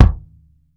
REG 2, KICK DRUM; Sampleheads; New York City Drumworks VOL-1

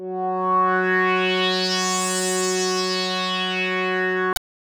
H.SWEEP, BIG SWEEP; Masterbits; Vintage Voltage

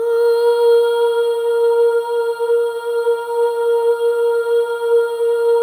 SOLO BOY, AH; Spectrasonics; Symphony of Voices

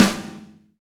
Rock Snare, Roland BK-5; Snares

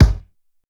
SPEED KICK, drums; Basement drums vol 2

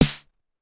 Snare, Pitched Up; Clavia DDrum-AT

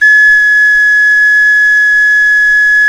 Clay Flute

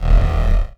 Basz, One Shots; Sonic Foundry (Sony Creative Software) - Future Retro Dance Excursions

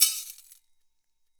metal; ILIO - Ethno Techno

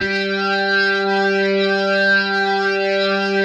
G4, OCT DIST ORG; Sonic Reality; Vintage Timetraveler

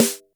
Boss DR-660 Snare, Drum Machines

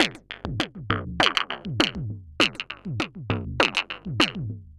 perc 100 gravel, Percussive Loops, 100 bpm; Sample Magic - Transmission-X

